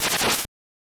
Spin Attack

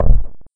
thump deep

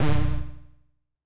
Menu Confirm

